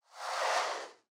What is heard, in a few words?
Swoosh Transition